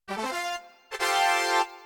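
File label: Door Opened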